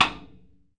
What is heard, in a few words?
Surdo rimshot; E-MU Producer Series Vol. 2; More Studio Essentials; Composer